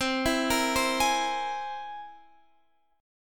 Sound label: C Augmented 7th, Guitar, Standard; arpeggiated; JGuitar